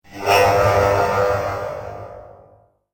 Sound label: Deep Metal Whoosh; Whoosh Sound Effects